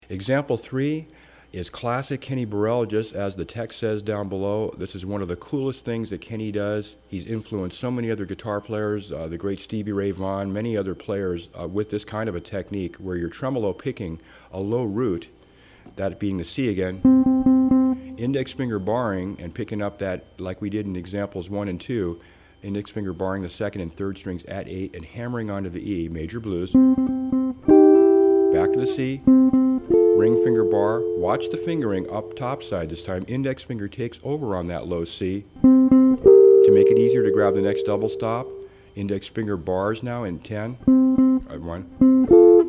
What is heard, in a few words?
kb tremolo